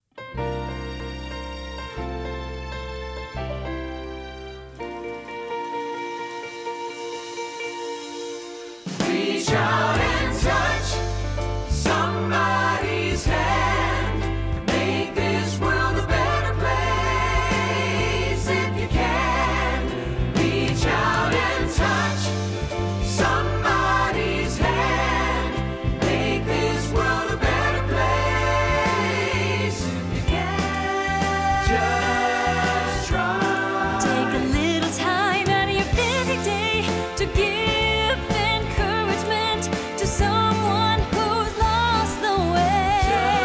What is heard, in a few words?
Motown section 4 vocals